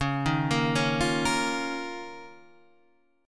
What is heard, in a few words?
F 7th Suspended 4th Sharp 5th Db